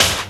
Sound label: GRUVE DRUMS; Rubber Chicken Software Sample Bank